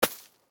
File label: tools snowball; teardown; ps5 windows xbox series xs